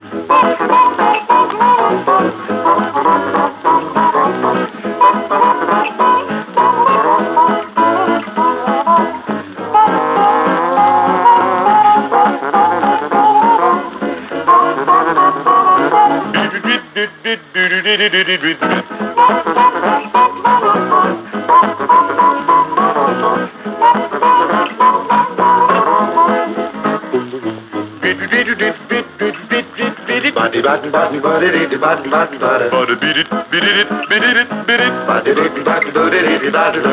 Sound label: music; Mafie 1 cz